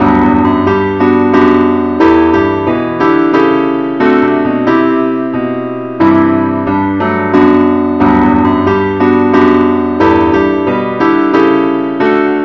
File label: keyboard only